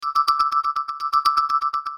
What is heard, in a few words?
barium alarm; acer